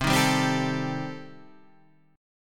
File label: D sharp 6th Flat 5th, C